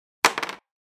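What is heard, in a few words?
falling sound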